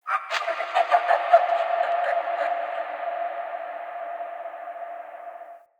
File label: small creature; Ambience; Under City